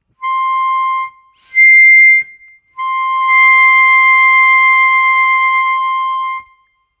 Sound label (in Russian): +7+10, октавы, губной гармошке; блокировки языком